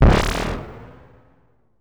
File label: Sci fi